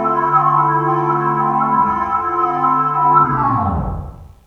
Northern Breakdown Pad; Jungle; Pads; Blu Mar Ten; Jungle - 1989 to 1999

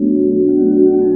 Love Pad; Jungle; Pads; Blu Mar Ten; Jungle - 1989 to 1999

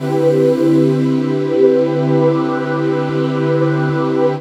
Pad; Blu Mar Ten; Jungle - 1989 to 1999

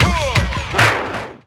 Skid; Jungle; FX; Blu Mar Ten - Jungle Jungle - 1989 to 1999 Samplepack

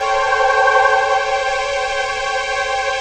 Roll Pad - 8A; Jungle; Pads; Blu Mar Ten; Jungle - 1989 to 1999

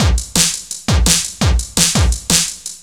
Shock Break; Jungle; Breaks; Blu Mar Ten - Jungle Jungle - 1989 to 1999 Samplepack

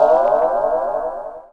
Jazz Thing Hit; Jungle; Blu Mar Ten - Jungle Jungle - 1989 to 1999 Samplepack

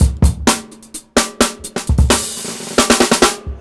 Breakbeats

